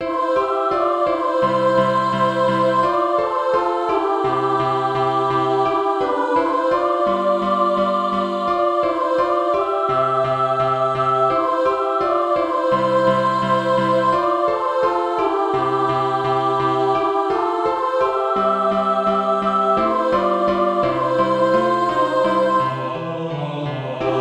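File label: mixed, Practice Music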